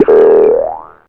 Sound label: NOISE MAD